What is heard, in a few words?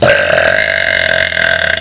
Bass Chunks